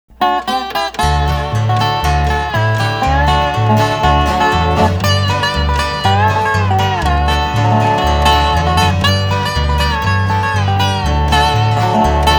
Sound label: Dobro Polka